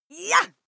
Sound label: female fight attack shout short